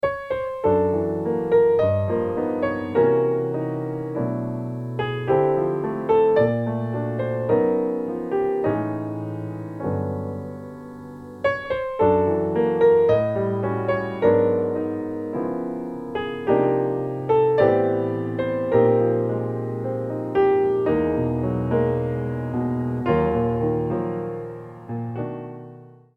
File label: solo piano